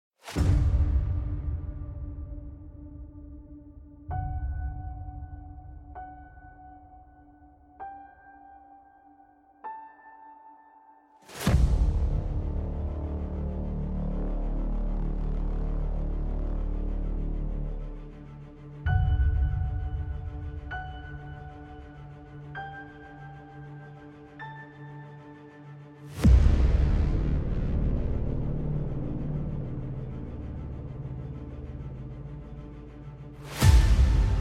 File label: Symphony in D Minor; Ode to Joy; Fourth Movement; Op. 125